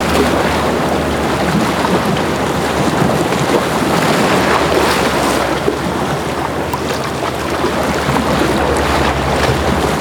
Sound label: Waves Intense; ambience; pet rock